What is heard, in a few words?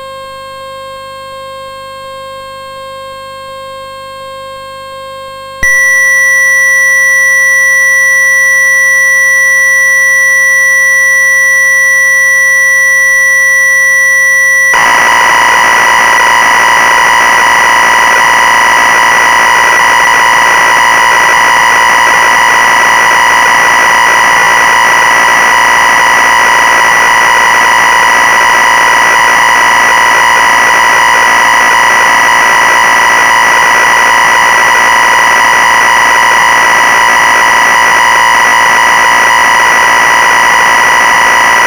akai ax80 factory